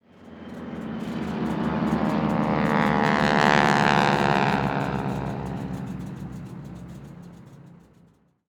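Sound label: Ambient Vehicles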